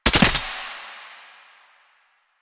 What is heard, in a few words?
Gunbrella Mech, Weapons; Warframe; Tenno; Koumei